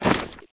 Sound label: Equip; Learn to Fly 2